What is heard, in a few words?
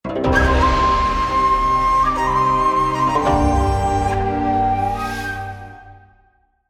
Win